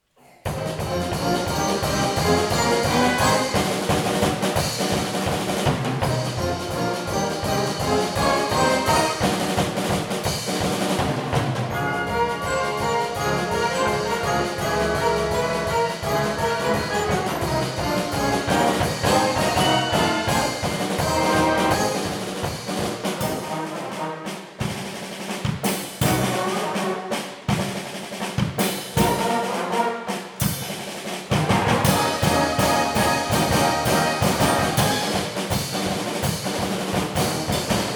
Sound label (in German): Schlagzeug